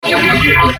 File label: Sweeper Sci-Fi Swell; Blastwave; Production Element Imaging Element Accent Transition